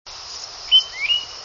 titmouse, tufted titmouse; birdsongs

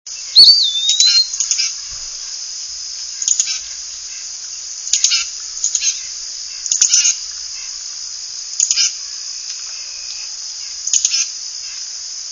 pewee and titmice; birdsongs

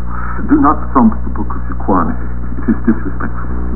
thump

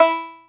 banjo

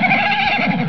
horse, funny sound

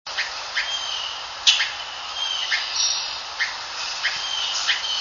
heron green; birdsongs

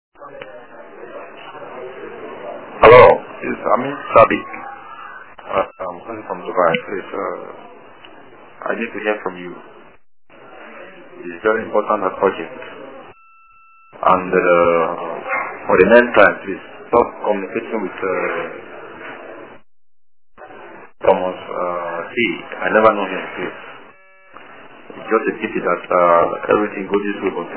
Warning

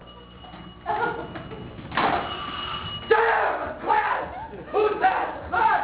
blast

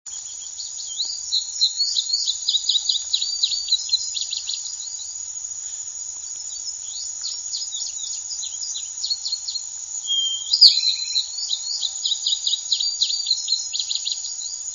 bunting indigo with E Towhee; birdsongs

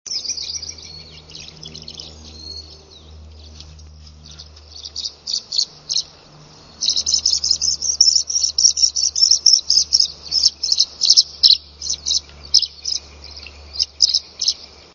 swallow tree; birdsongs

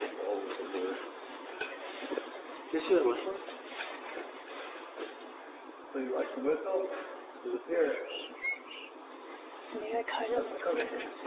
whistiling

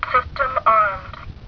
alarm, buick

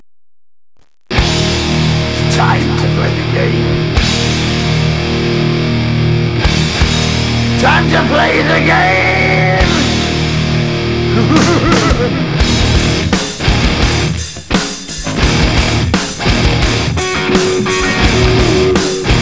caption contest music

